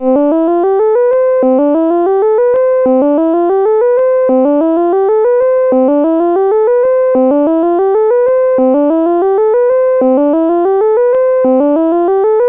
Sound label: Simple Scale, sid, CSG8580R5